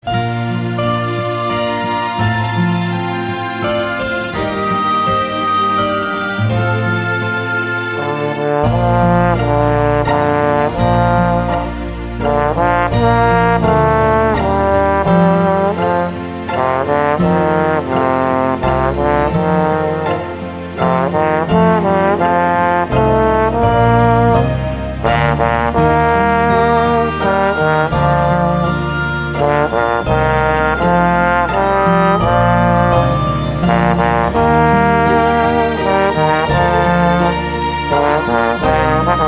Trombone

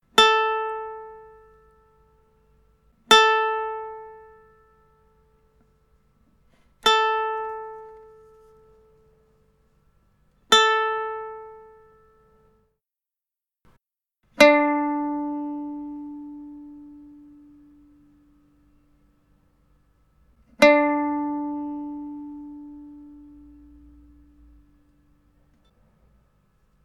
Tuning, ukulele

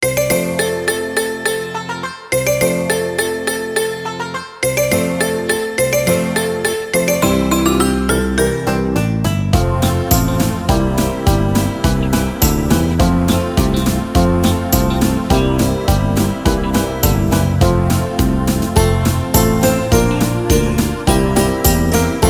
recorder

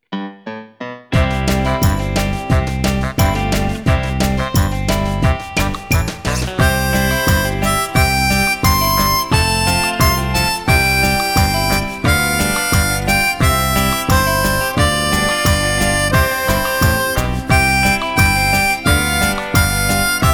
Harmonica to listen to, blues harmonica

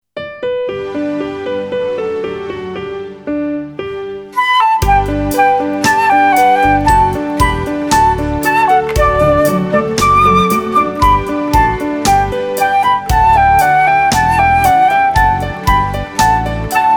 Flute

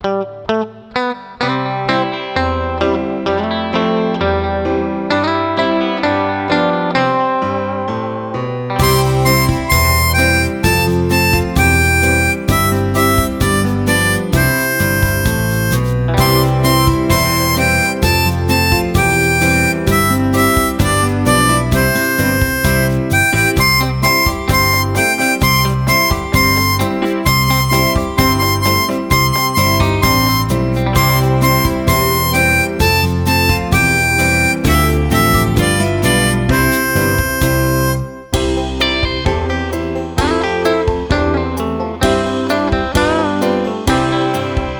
Harmonica to listen to, blues harmonica; Old MacDonald had a farm

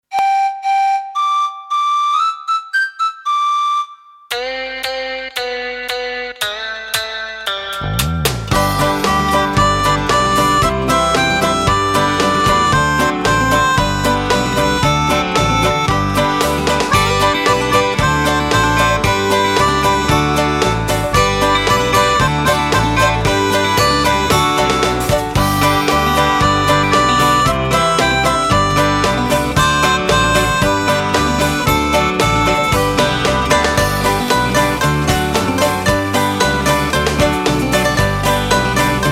Bah Bah Black Sheep To Listen To, ukulele

